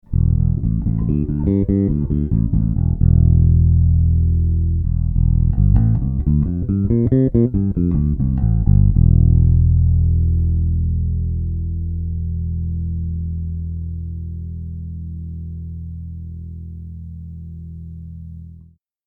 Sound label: BELDEN 8412P Bass